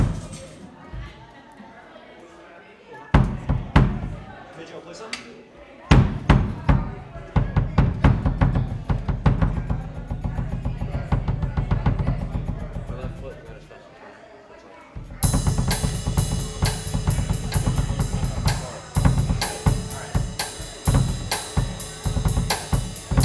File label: Drums Double Kick